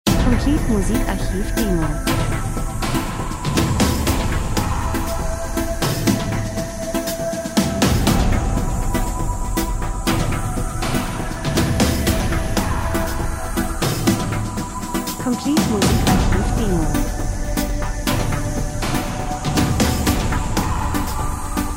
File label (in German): drums, rhythmus; Klangfläche geheimnisvoll abwartend interessant Schwenk Natur Reisen Schlagzeug Sequenz